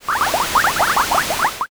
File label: boiling